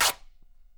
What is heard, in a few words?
Canvas Bag Rips